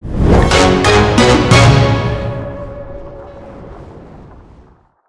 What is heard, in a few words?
Large Win; netent